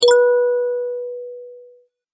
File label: notification